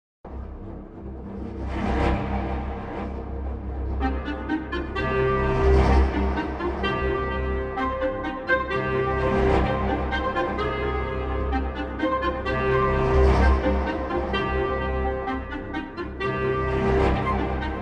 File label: Evolved Woodwind Loop Straight Performance; VENTO; Designer Combo